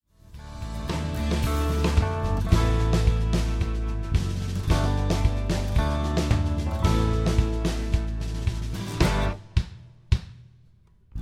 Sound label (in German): es ist gut dass du gekommen bist playback